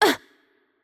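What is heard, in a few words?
Hurt Light, German; bloom minigame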